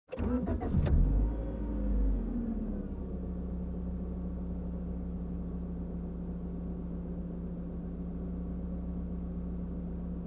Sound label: Revving up engine from cockpit